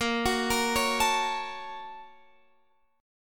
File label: A sharp Minor Major 7th Sharp 5th, down, Guitar, Open F; A#mM7#5 arpeggiated